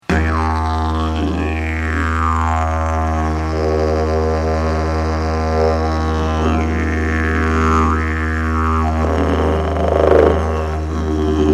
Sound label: didge